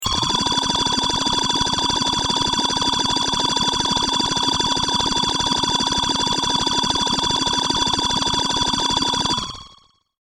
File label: Scifi, Device, Atomic, Processor; Science, Fiction; Tech Sound Effects